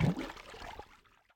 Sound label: Underwater - Swimming; RadwinIsland